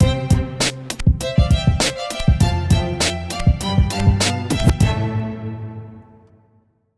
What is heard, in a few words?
100bpm light staccato cello STING